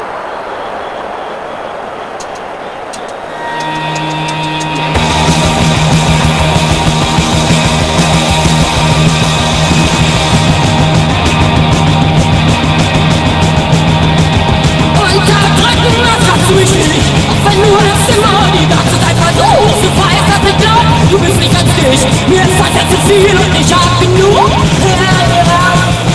The Punk Hymn, recorded during an exhibition football game in Manchester, England